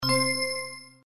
spin; CandyStorm

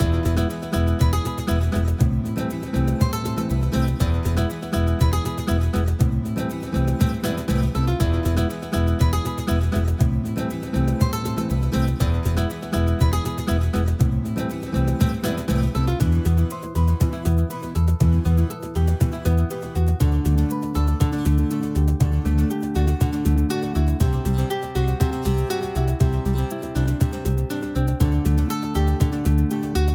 gamble ambient; games; Corrida Romance; wazdan

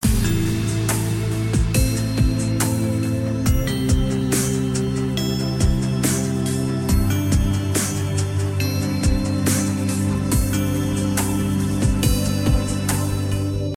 melody; Party Night

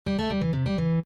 roll start fast; Hot Party; Wazdan

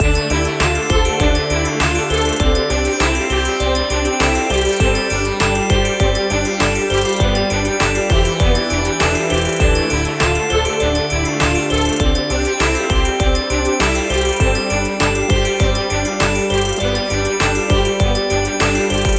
count up; Fire Queen

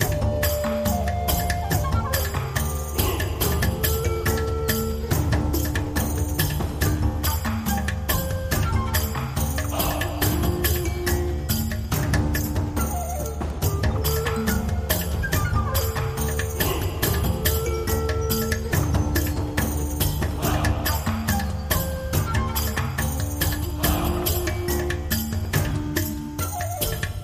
respin ambiance; games; Aztec Gold Megaways

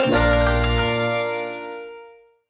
symbol common; Wild Guns

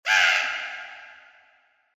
bird caw; trex; The King Of Dinosaurs

